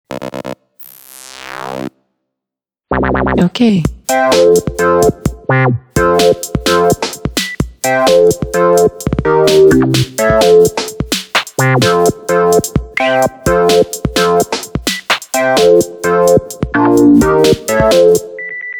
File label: Break beep, Windows Mobile ringtones; Microsoft